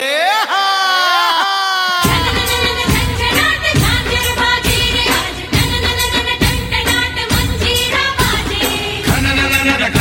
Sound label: dhol navratri song ringtone; Navratri Special